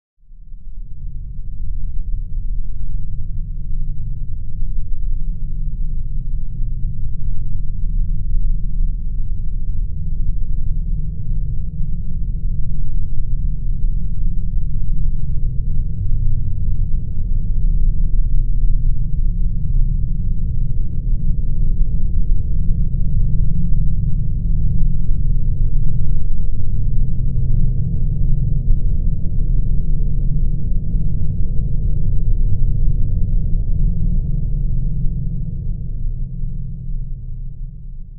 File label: Bed Menacing Pulse Lf